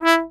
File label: trombone staccato E3; Orchestral; DirectWave; Varazdin Orchestral